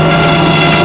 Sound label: Coal Car